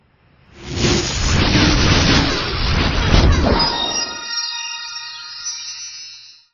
freak lightning

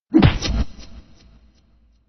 teleslash; teleslash noises

